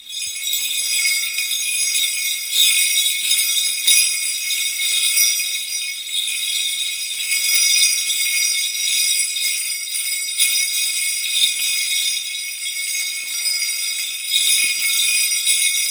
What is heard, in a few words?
24 bells